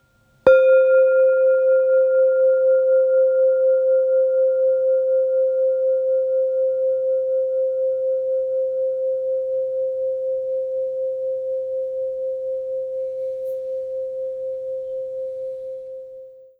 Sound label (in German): Klangschale 528 Hz Solfeggio; Heilung DNA